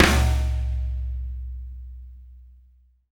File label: TRASHY KIT; Spectrasonics - Burning Grooves CD2